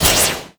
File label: Doubleslash Shot; gamerip; disney star smash; android